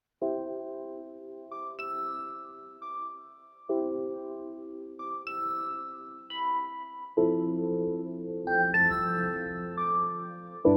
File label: rainy lofi